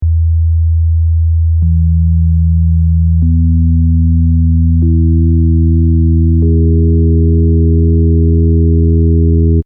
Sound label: Chord of Nature; pure 82 Hz tone, with the harmonics 2x, 3x, 4x and 5x added successively; This is the Chord of Nature. It is a sonic manifestation of number, and of the laws of the universe, and it’s very simple.